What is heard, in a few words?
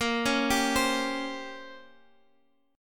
Bb Minor 6th Add 9th, Guitar, Drop D; x,13,11,12,13,x